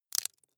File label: wood chip small break creak